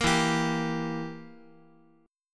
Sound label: E Diminished E, Guitar; Edim strummed; JGuitar